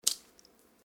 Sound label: Footsteps Puddle One